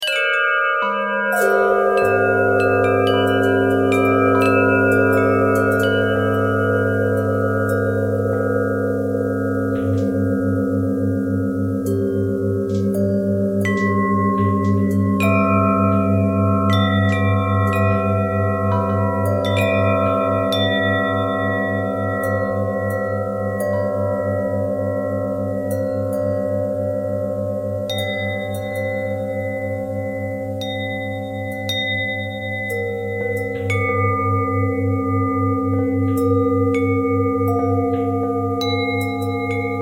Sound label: bell harmonic twist